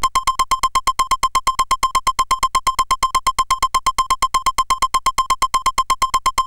Shuffle, CSG8580R5

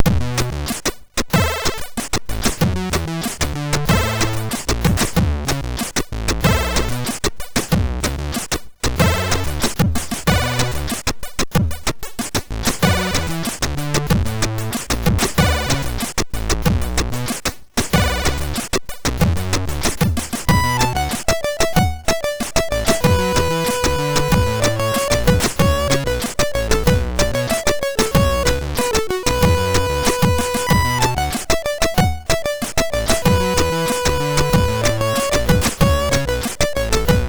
MOS6581R2, SID; Hurtig

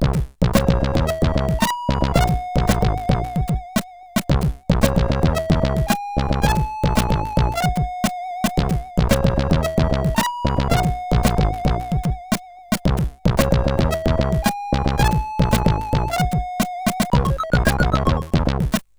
Intro CSG8580R5; Style Intro; hvsc

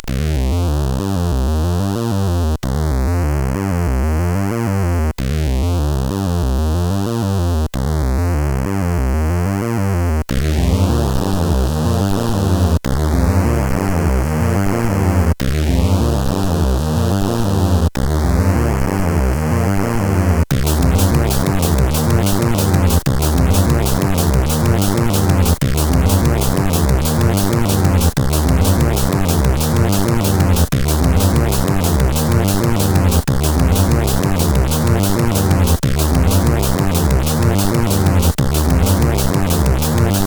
CSG8580; Tunnel B1

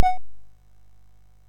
Dragons Den, MOS6581R3

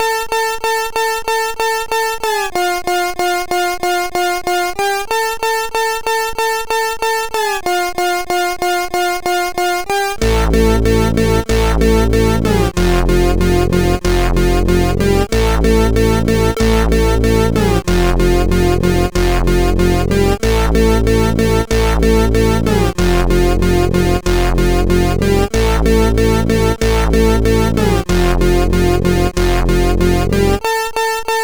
CSG8580; CSG8580R5; Square Scape 2

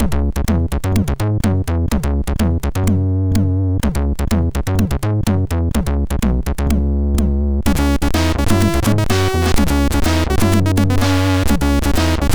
tune, sid MOS6581R2; Krombacher tune 4; Cyberdyne

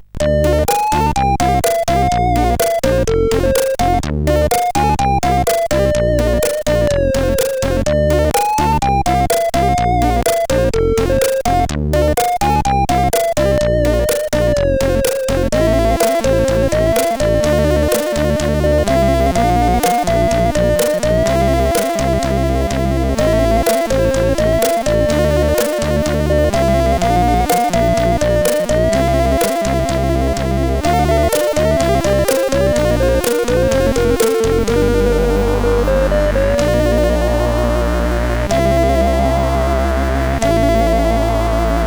Flute Song, CSG8580R5; Schutten Martijn

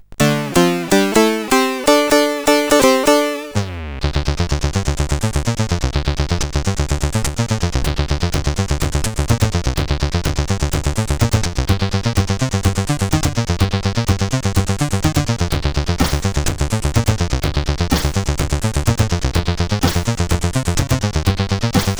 Jam, sid CSG8580R5

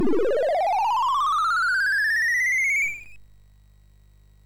SFX, MOS6581; Narco Police

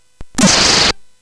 Shuffle, CSG8580R5

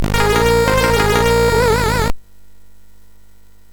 Boing, MOS6581R4; hvsc

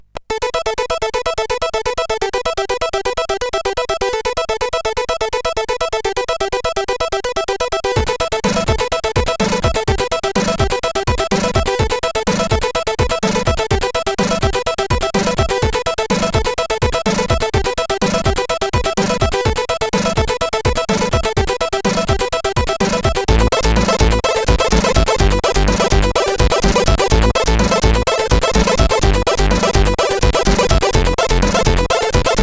Jam, CSG8580R5; hvsc

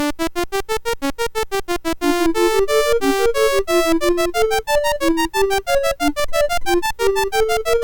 Baroque Music 64 BASIC, sid MOS6581R3

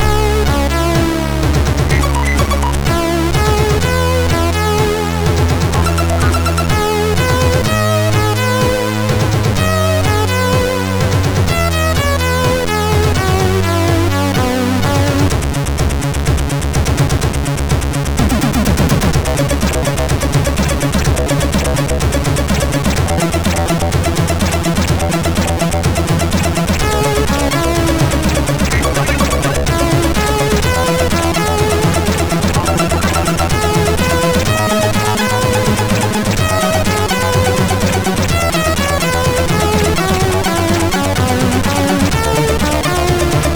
sid MOS6581; MOS6581R3

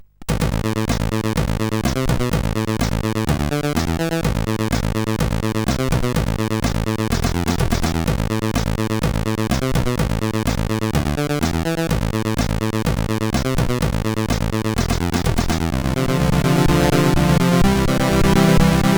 Laser Remix, sid CSG8580R5

